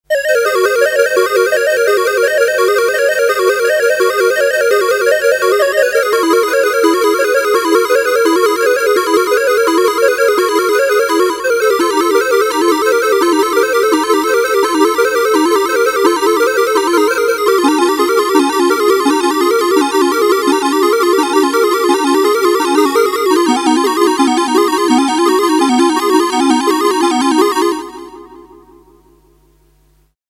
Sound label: RS370, Polyphonic harmonic generator; Track 8 'Roller Coaster' Use of the RS390